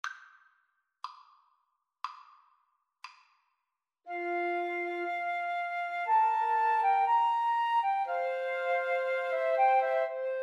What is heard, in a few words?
romance, Flute Trio